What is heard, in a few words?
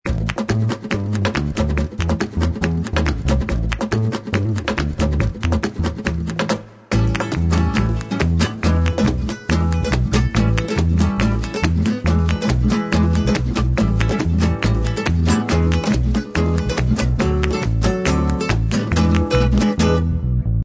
Music; The Adventures of Puss In Boots - Catch The Thief; NextlevelGames